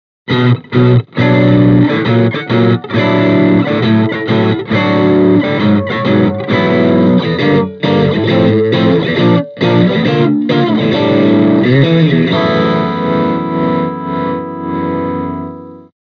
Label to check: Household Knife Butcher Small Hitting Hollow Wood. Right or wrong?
Wrong